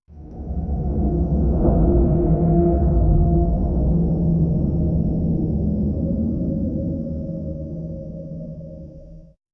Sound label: alien desert wind hollow